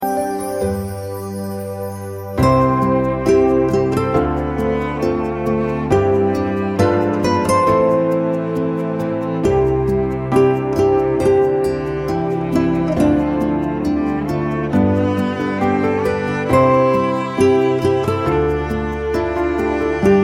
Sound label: instrumental